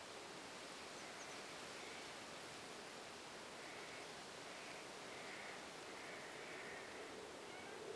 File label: ambient